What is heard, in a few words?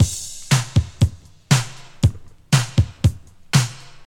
119 bpm rock breakbeat sample f sharp key, Free drum groove - kick tuned to the F# note.